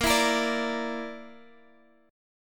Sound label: A sharp Major Flat 5th, Guitar, Half Step Down